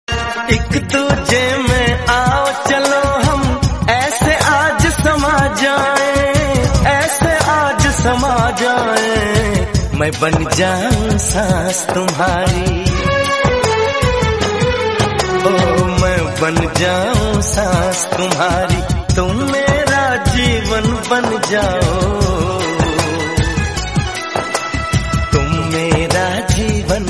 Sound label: love romantic hindi